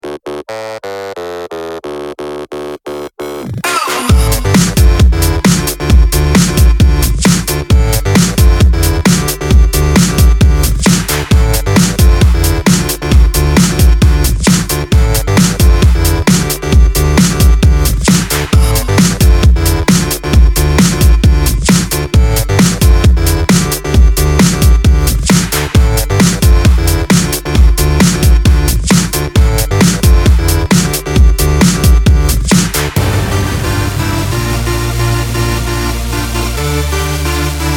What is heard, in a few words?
Instrumental